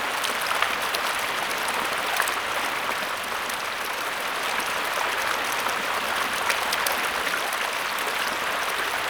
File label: Torch Puzzle Waterfall Loop; Puzzles